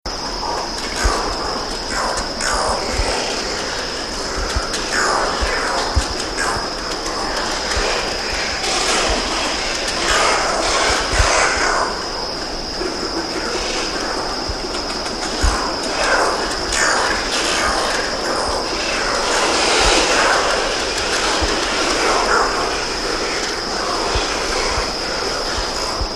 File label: Oilbird, S. America